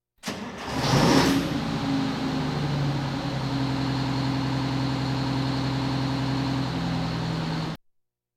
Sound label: Police, Fire engines